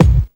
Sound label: BD, Dance Mega Drums, BD HOU; Best Service